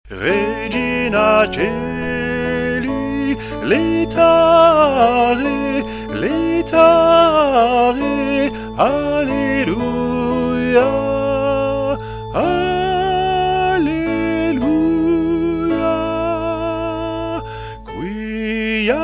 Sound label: Regina coeli